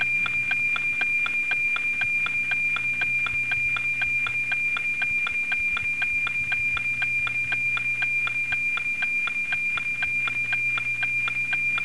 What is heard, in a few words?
NOAA17